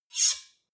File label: two knives large scrape fast hard